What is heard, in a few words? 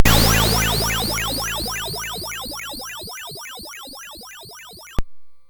Pulse, MOS6581R3